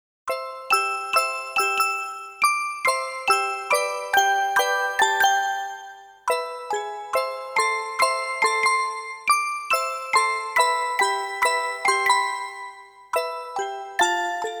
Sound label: Orgel version; Hatsukoi Original Sound Track Album